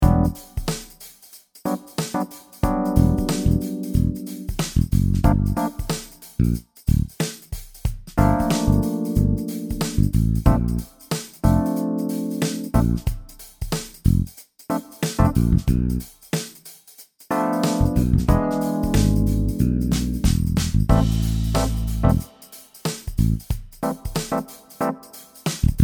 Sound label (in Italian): senza chitarra